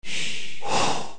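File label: exclamation; vozglas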